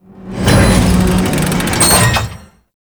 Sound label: Water Wheel Stop; Props; Warframe; Grineer Forest